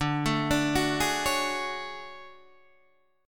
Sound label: D Major Sharp 11th Guitar DADGAD